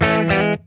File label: A-E PASS, Funk Guitar; Zero G - Funk Guitar